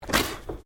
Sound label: Animal Alpaca Male Snort; Alpacas on a farm in Gippsland Victoria